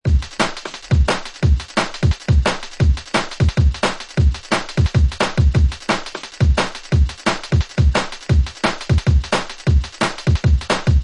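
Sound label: Dread Kick An Slap It, beats; ray keith dread jungle breaks vol 1 60 beats for dubplate clashes battle junkies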